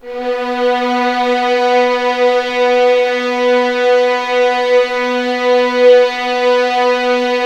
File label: B3 LEG FVL L, The Ultimate String Collection; East West